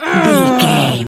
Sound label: voice female growl frustrated